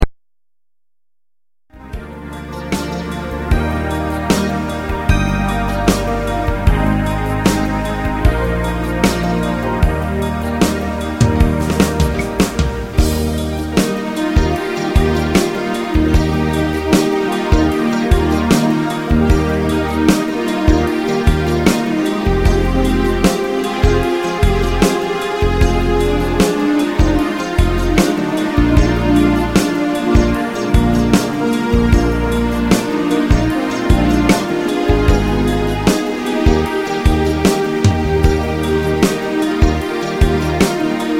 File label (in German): Traummelodie, kamin